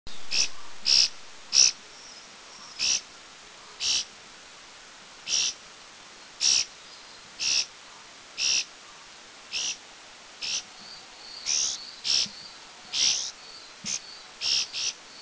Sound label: Bhutan LT chatter; Dirang; India